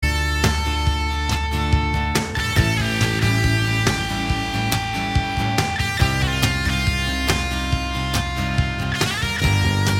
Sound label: music